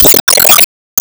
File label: Enemy Fashion Damage Hit; Disney Star Smash; Android, iOS, Mobile